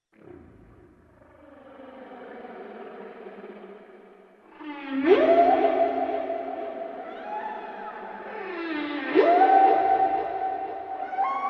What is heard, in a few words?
Whale Song